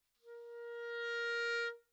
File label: sneakin tongue, clarinet articulation